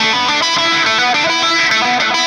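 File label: Delay Wah, Guitar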